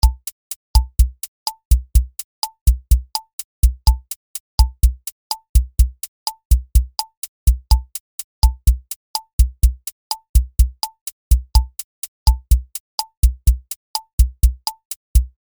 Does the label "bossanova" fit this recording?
Yes